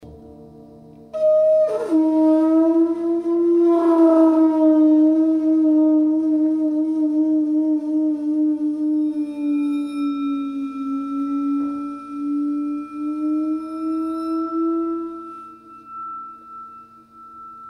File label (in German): Muschelhorn; Am Gleis A